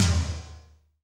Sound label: TOM TOM, Roland